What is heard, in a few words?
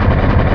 Vehicle Speedboat Idle; Playable Tutorial Level; TR2